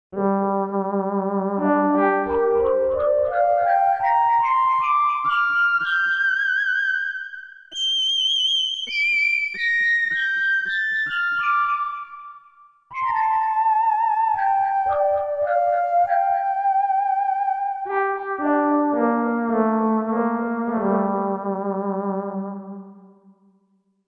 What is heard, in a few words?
Splt Whistl; Korg MSC-1S Variety